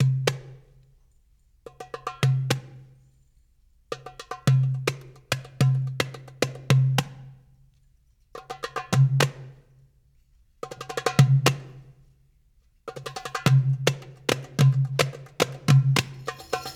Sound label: Drum Intro